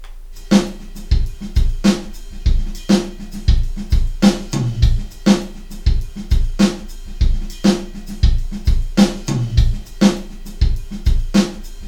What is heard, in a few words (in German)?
drum mit ams